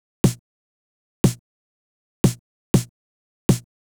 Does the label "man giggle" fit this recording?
No